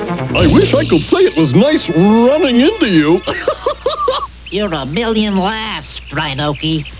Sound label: rhino